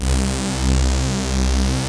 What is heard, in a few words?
RESO GRILLE; Pro-Rec - Synthsonic